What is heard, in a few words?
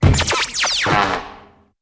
Drum Hit W synthy Bee, zaps